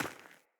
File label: Sandals Run Rock; Player